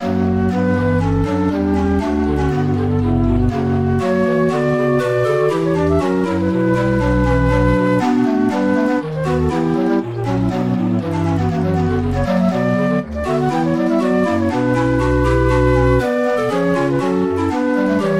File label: organ